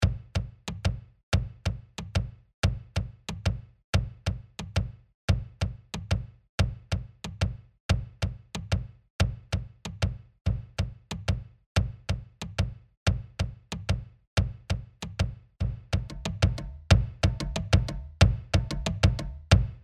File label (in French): SANS VOIX